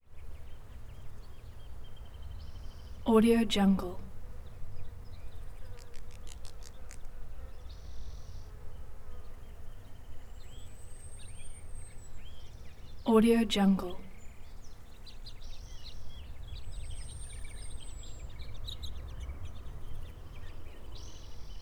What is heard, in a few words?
san francisquito canyon bugs birds